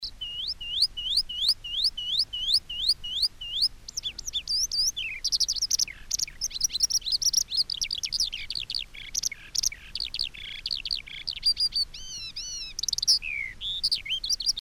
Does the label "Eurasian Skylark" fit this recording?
Yes